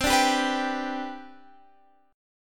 C Major 7th Suspended 2nd, C, down, Guitar, Standard; strummed; CM7sus2